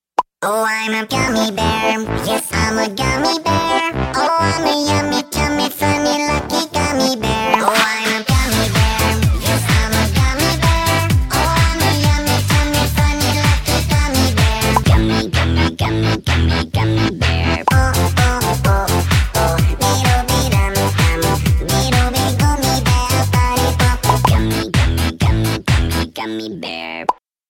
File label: ringtone